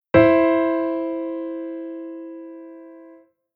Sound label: Chord Interval Inversion